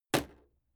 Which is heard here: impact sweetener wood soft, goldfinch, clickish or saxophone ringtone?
impact sweetener wood soft